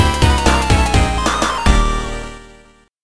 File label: Level 5 Game Over; Amiga